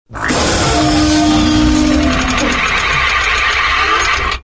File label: false start; movers objects